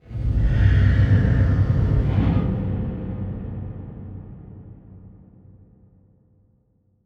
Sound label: Production Element Title Transition Demonic